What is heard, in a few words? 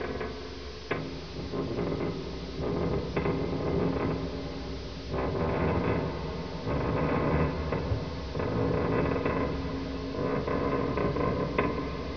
loud creak; environ; Gunlok